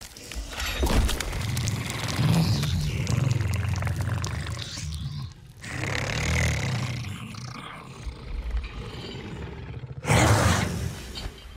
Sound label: closeup noises